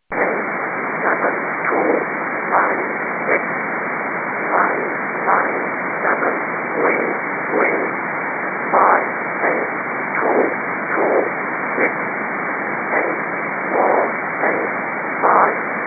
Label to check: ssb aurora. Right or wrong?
Right